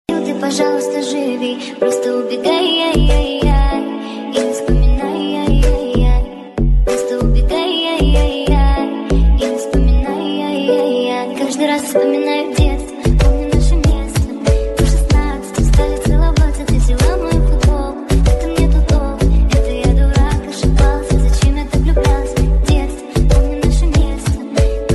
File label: new english tiktok viral ringtone